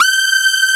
Aud Synth G5; Digital Sound Factory Xtreme Lead

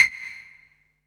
PIZZ, Dance Mega Synth

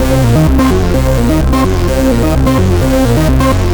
Modu Arp, 128bpm, C, analogue circuit; musicradar

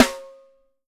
wood; Sampleheads; Peter Erskine Living Drums

